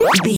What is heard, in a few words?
retro enemy attack